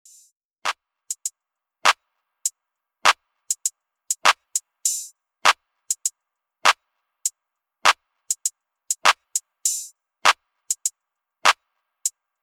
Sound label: clap intro